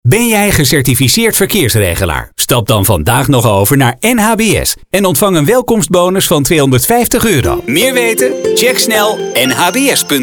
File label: Jingle